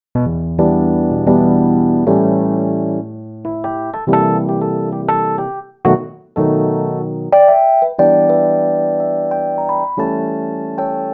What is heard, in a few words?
Stage73, Roland SRX-07 Ultimate Keys